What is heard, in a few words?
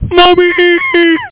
DEATH; supr doom